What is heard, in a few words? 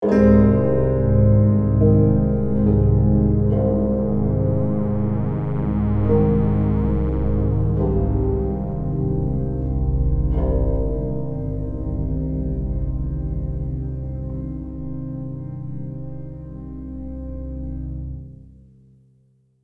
Success (Stinger)